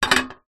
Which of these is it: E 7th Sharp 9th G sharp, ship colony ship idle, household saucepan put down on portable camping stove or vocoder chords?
household saucepan put down on portable camping stove